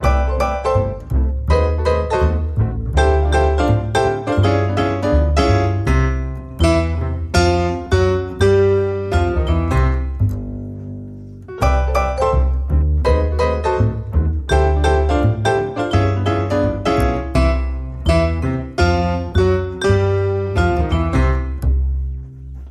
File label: Swing, Theme No Drums